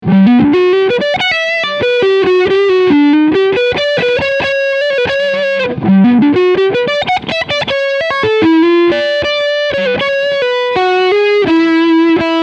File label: Crunch P90